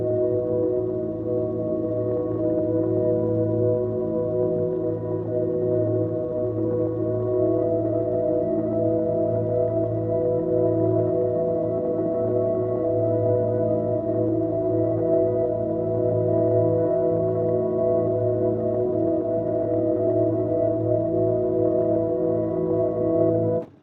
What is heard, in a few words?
pad E; tayna; base pack